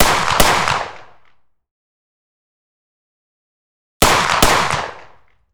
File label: Smith & Wesson M66 .357 Magnum left off axis Double shots; 1m; MKH416